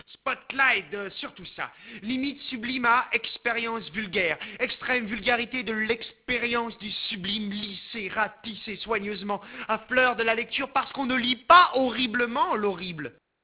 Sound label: beat